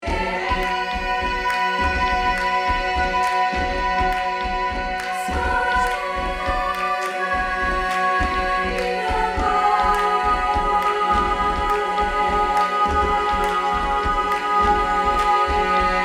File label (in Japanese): ブルガリアンボイス